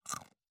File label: bite chomp; angband